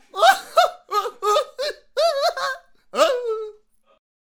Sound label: Jouster Attack Vocals